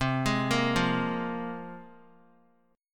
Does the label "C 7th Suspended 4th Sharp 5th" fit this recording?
Yes